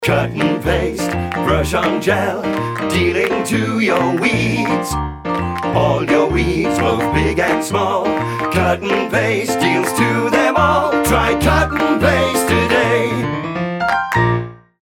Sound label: Full Sung